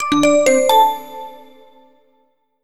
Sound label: newChat